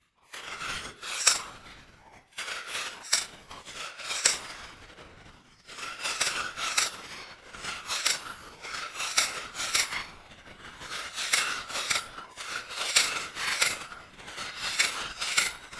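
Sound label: typewriter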